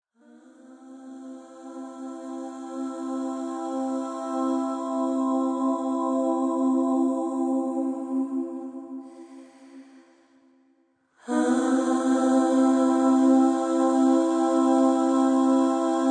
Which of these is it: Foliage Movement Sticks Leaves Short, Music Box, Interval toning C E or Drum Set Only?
Interval toning C E